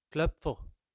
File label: gloepfer